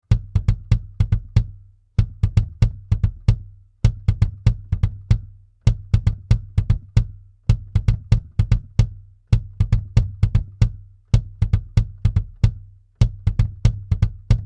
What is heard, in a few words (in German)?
Fredenstein F610 UE1 BD entzerrt Anhebungen Color